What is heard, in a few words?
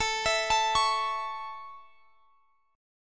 A Major A, Guitar Standard; A arpeggiated